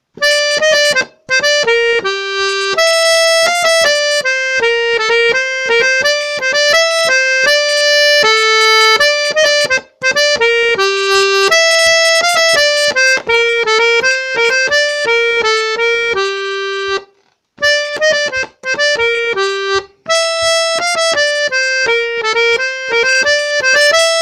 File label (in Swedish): fetpolskan melodi